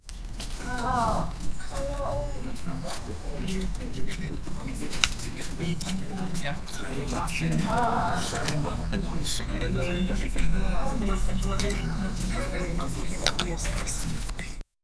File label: people doing ritual